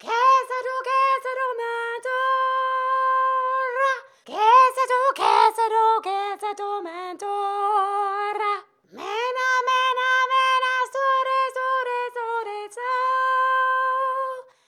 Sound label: sing